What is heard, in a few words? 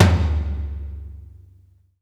FLOOR PROCE, toms; Sampleheads; New York City Drumworks VOL-1; SP TOMS